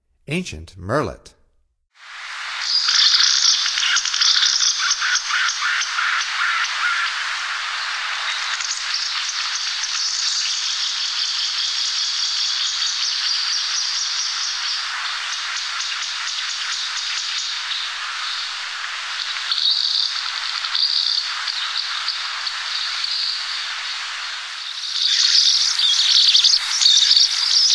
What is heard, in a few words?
Ancient Murrelet, Songs of Birds of North America; Stokes